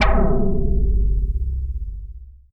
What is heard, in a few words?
bounce